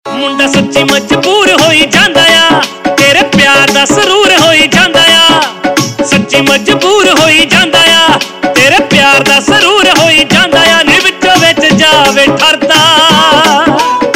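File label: Ringtone, Punjabi Pop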